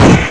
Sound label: bomb fire; pod skyrace